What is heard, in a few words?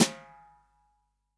SD, REAL DRUMS, SWING KIT; Best Service ProSamples vol.43; Real Drum Kits